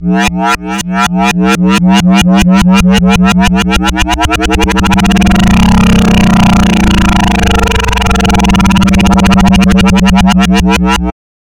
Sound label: BASS, "(F)"; neuro; Stanza